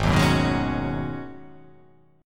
G sharp Minor 11th G sharp, chord

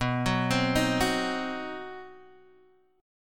C Minor Major 7th Flat 5th B, chord

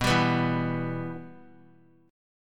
B Suspended 4th Sharp 5th B; Bsus4#5 strummed; JGuitar

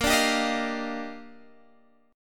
D sharp Major 9th A sharp

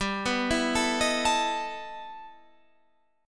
E Minor Major 11th G, guitar

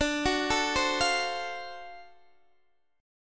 D 7th Sharp 9th D down Guitar Open D

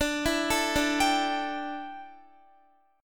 D Suspended 2nd Suspended 4th D down Guitar

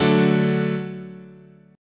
D Major, chord

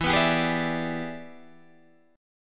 E 7th Sharp 9th Guitar Open D, chord

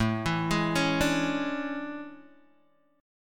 A Major 11th, down Guitar Open G

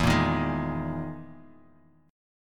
E Major 7th Flat 5th down Bass Standard; EM7b5 strummed; JGuitar

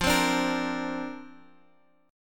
F 6th Suspended 4th F, chord; F64 strummed

